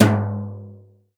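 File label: brush hard; Northstar - New Gold VOL-2; 50S BRUSH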